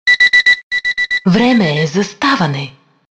Alarm Morning

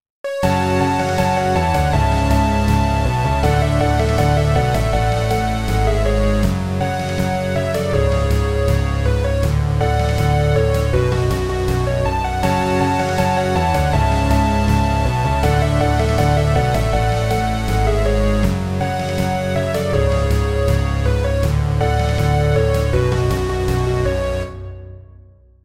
8bit Say Amen

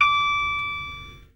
Bing

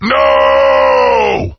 Noooooo; Serious Sam

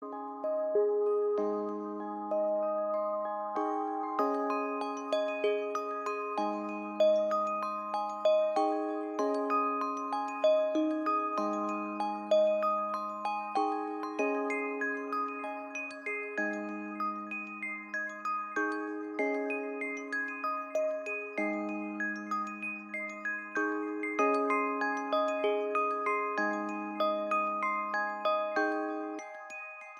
Iphone Alarm Ringtone

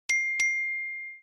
Double Ping

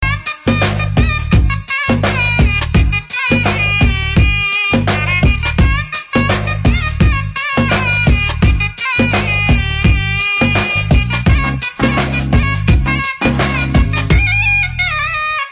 Beautiful Melody

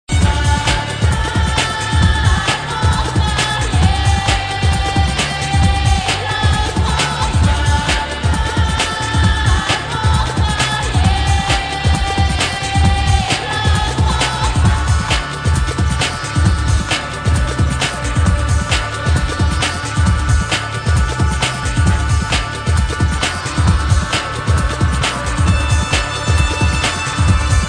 Loop

